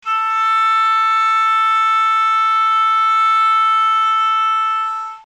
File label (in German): Kammerton Oberwellen